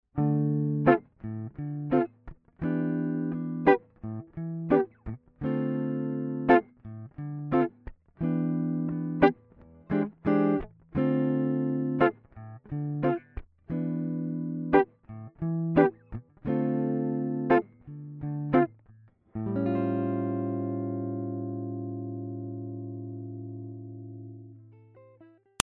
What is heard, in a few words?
Vintage Clean 2x12